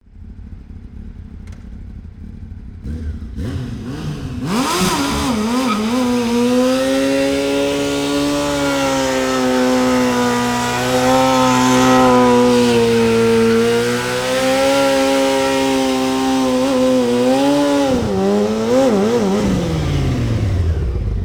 motocikl honda cbr 1000 drift na meste

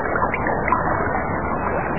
voda shumnii burnii priglushennii

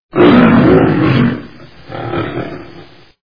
lev hochet zlobno zarichat no sderzhivaetsya

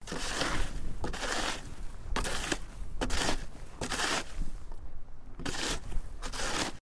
lopata snega lopatoj

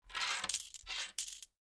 so stola sobirayut po odnoj monete i kladut v kopilku

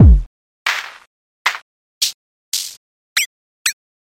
barabannij nabor zvukov sintezirovannie

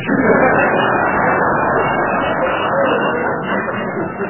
tolpa

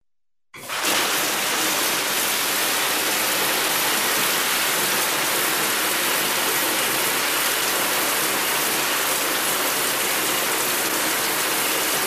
zvuki vodi v dushe otkrivanie krana s vodoj

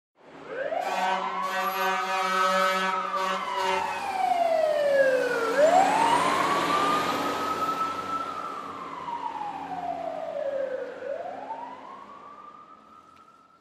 zvuki vozdushnogo gorna pozharnoj sireni

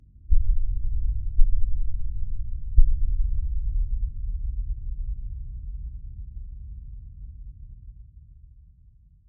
vistrel shumnij nizkij

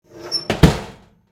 zahlapivayut dver gruzovika

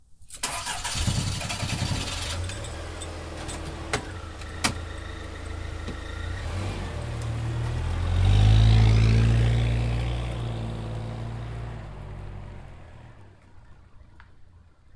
zvuk zavodyashegosya i uezzhayushego avtomobilya

